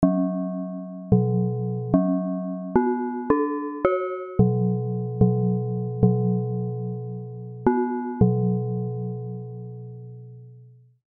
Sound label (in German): musik